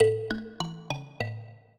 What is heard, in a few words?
minuet, mbira; Mozart